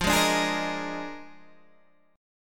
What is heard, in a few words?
Eb Major 7th Suspended 2nd Suspended 4th F